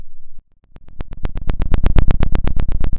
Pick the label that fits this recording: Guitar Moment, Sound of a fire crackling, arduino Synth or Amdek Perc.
Sound of a fire crackling